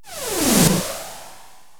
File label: ricochet, zaps and beeps